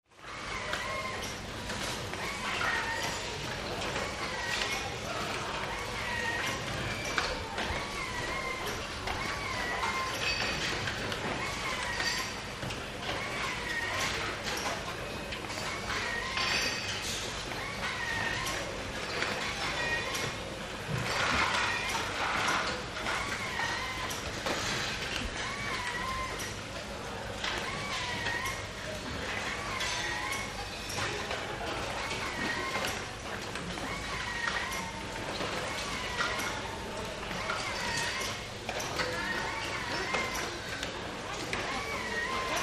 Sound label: Factory Large Busy, factory